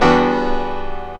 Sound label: P. CHORDS, KEYS